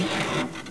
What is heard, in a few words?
SQUEAK; Matrox M3d